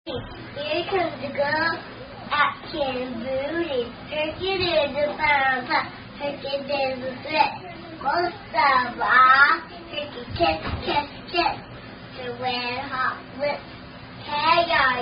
Kid Singing, Children's Rhyme; Crazy Song; Mailman Mail Man Do Your Duty

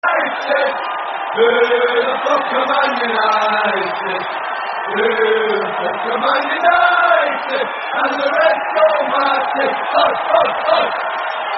Who The Fuck (With Crowd); Man United

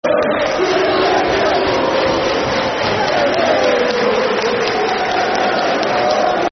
Football Chants (Soccer Songs); Oh David Moyes; Everton Efc

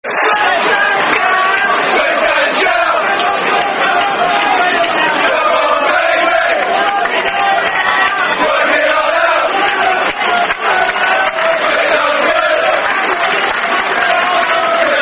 Football Chant; West Ham WHFC

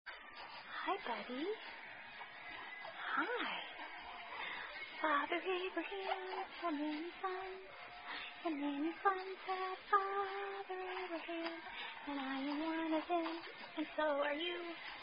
Father Abraham, Sung To Infant

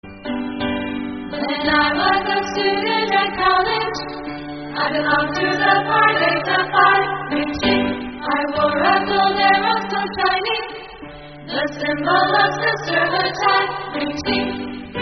Ring Ching Ringtone; Pi Beta Phi

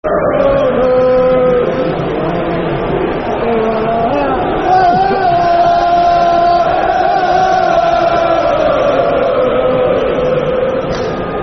La, La, La Lalalaaaa.... Rovers, Football Chant; Bristol Rovers Brfc

